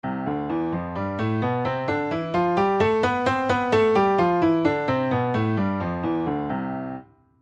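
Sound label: C major 2 octaves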